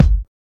00s warm bass drum single shot g key, Royality free kick sound tuned to the G note.; kick drum samples